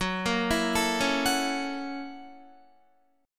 Gb 7th Sharp 9th Gb Guitar; arpeggiated; Gb7#9; JGuitar

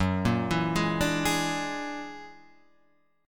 Gb Augmented 9th Gb down Guitar Half Step Down

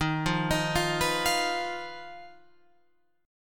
Eb Suspended 2nd Sharp 5th, Guitar, Open F; Ebsus2#5 arpeggiated; JGuitar

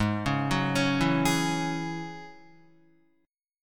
Ab 7th Suspended 4th Sharp 5th down Guitar; Ab7sus4#5; arpeggiated; 4F,4A,5C,5G,5C,6E; chordshape; JGuitar

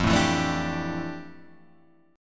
F Major 13th Guitar Drop D

